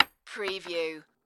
Bullet Shell Drop Machine Gun Solid Wood

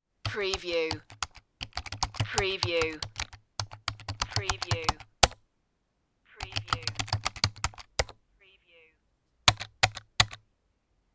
keyboard typing

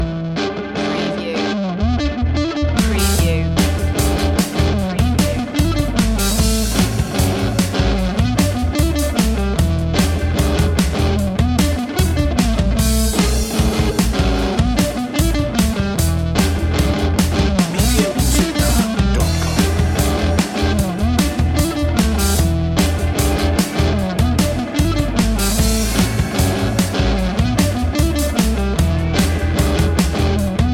bikers blues

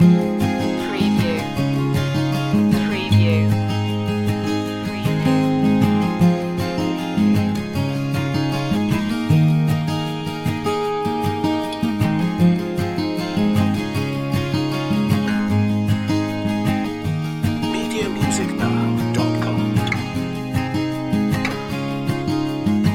loop

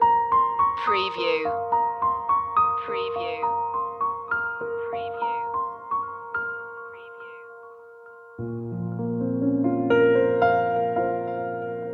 drops of water loop end; media music now

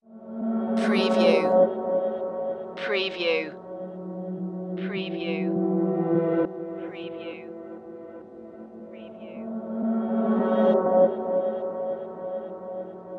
distant calls

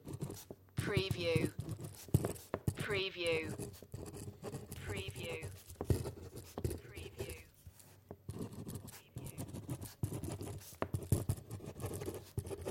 writing ballpoint pen

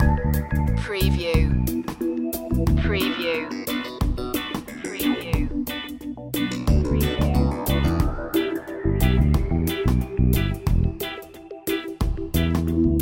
dub loop

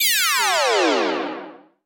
Descend Spacey Quick

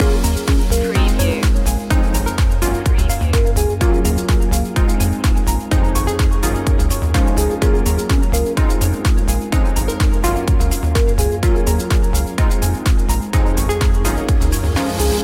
project positive loop

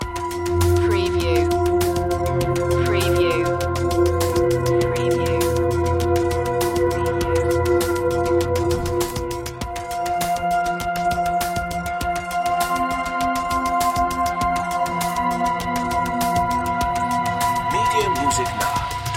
alien landscape loop

